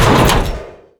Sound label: metal scrap large impact